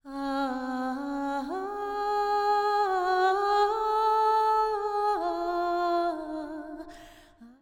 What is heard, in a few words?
intro atmo Vocals 16 beats Of 64 beats Loop